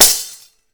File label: DAF HIT; AKAI S6000; WorldSounds